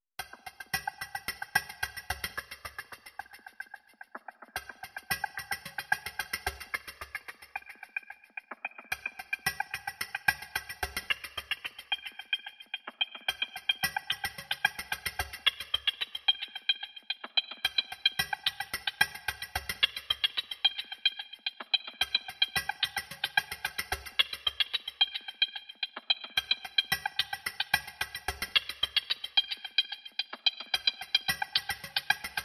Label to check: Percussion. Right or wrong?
Right